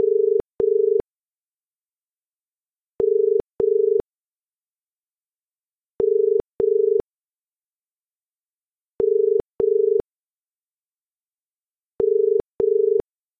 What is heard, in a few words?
Au Ringing, tones